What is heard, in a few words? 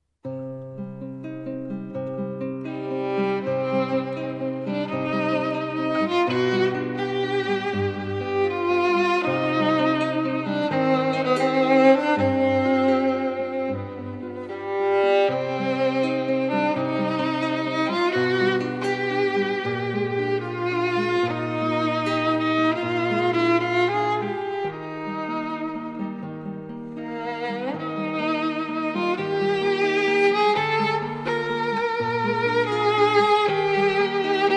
duo violin guitar clasical